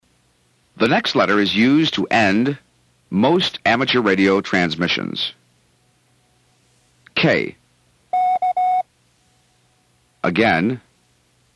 KPBG, Morse Code; Tune in the World

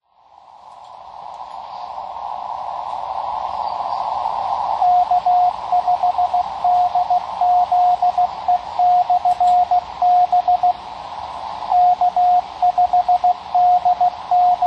beacon, 10m; K5DZE; KY

